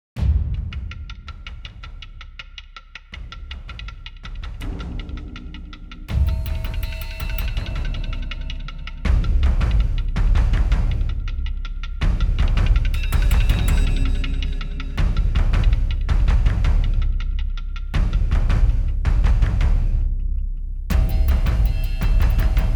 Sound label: Combat Loop; Harley Police